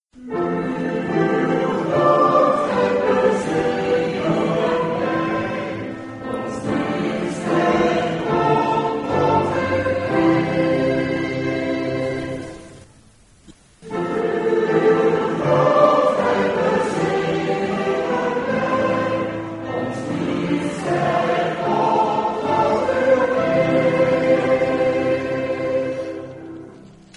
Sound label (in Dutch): Antifoon psalm 150